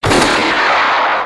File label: fire gun high; Gunshot sound (higher); Sega Gun Fighter